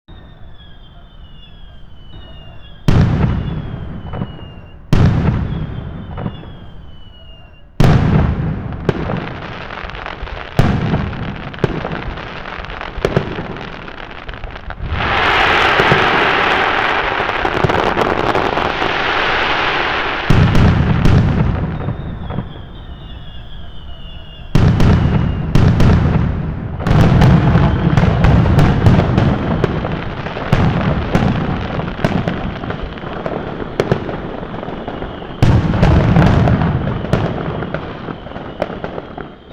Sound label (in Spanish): traca total, fuegos artificiales